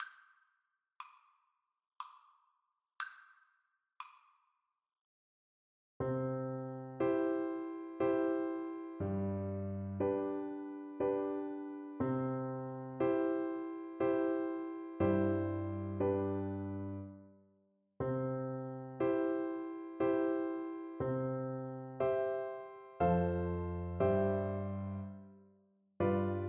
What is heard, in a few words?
afton water FL kar, Flute